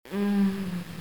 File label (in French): Gemissement faible